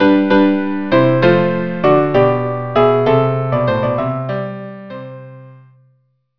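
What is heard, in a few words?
viola cello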